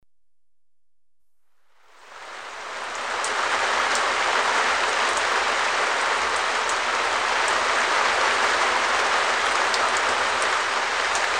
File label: Rain on perspex roofed shed; Weather; The BBC Sound Effects Library